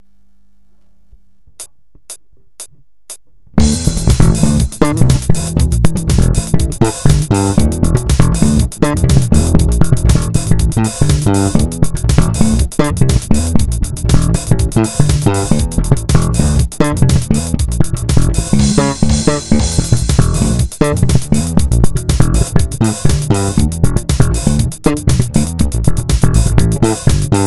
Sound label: pow bass